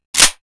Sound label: lightning clip in, weapons